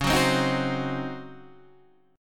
Db Major 7th Suspended 2nd Suspended 4th Db Guitar Open D